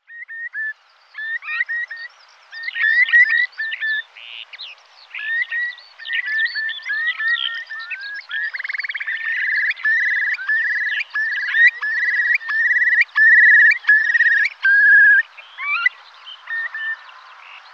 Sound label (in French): Courlis cendre, oiseaux; audio les oiseaux